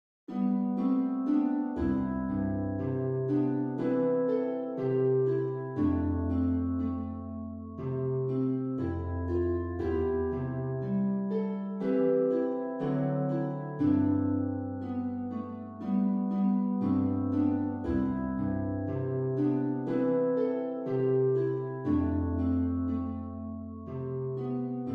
harp

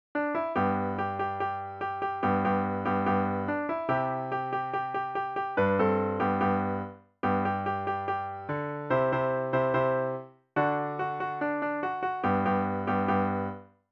run from the farmer piano

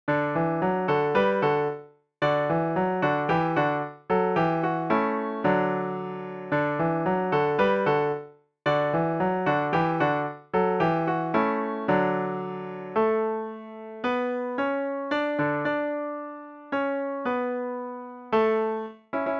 easy piano solo; hallelujah chorus

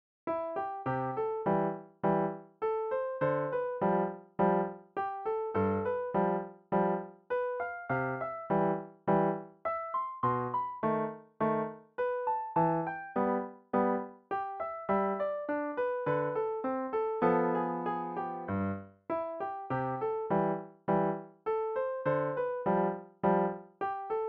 piano

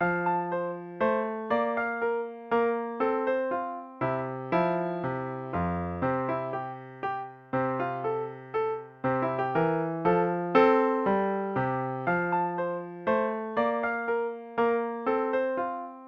piano

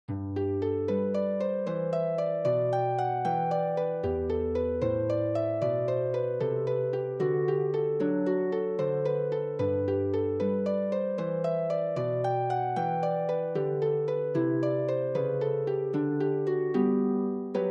jesu joy of mans desiring harp

